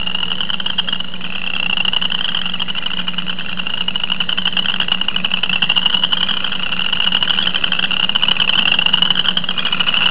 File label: upland chorus frog